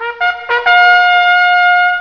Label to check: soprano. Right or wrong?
Wrong